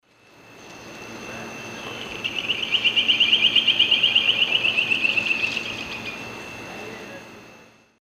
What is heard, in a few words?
Berlepschia rikeri, song; S. America; Eastern Lowlands